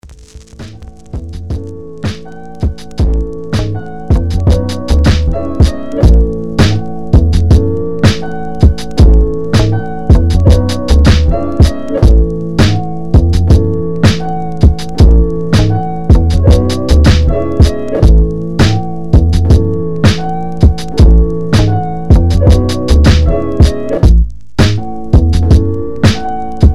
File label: Instrumental